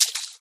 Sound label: footstep water; Scrat